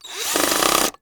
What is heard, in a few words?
Cordless Electric Drill Tighten; Tools